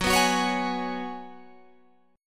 Gb Suspended 2nd Suspended 4th Gb, down, Guitar, Open G